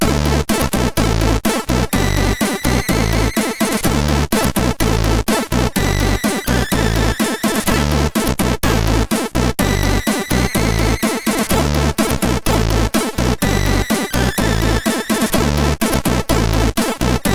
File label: Power sid CSG8580R5